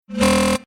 Future Telemetry Short Computer Processing Scifi Digital Interface; Sound Design; Computer Sound Effects